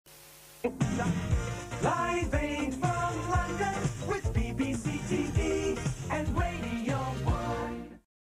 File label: Radio One Jingle; BBC; Live Aid